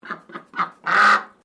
Bird Duck Peking Quack Agitated

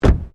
Car Ford Focus Door Front Close; Ford Focus hire car; Cars; Vehicles

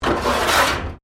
METAL BIN SCRAPE CONCRETE

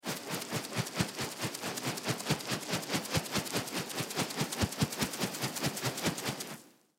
Rope Spin Twirl; Rope Spin Overhead Whoosh Twirl; Rodeos; Foley; Blastwave

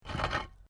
PAVING STONE MOVE, dragging; Material components for constructing more complex sounds; Sound Effects:Objects

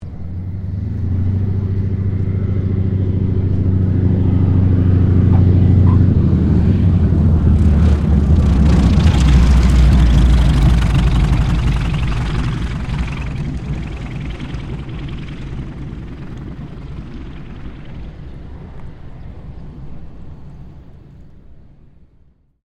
AIRCRAFT AT-6D HARVARD MK III LAND; Temora Harvard MK III; Airplanes; Vehicles; Airplane Sound Effects

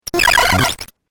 Crazy Glitch 8Bit Style; Video Game Sound Effects

